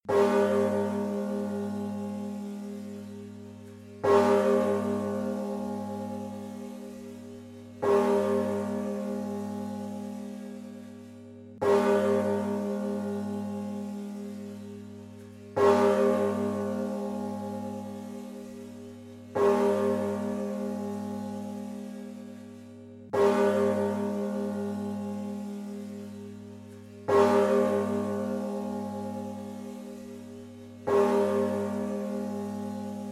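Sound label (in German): Münster in Villingen gesprungene a Glocke; bonus kap 1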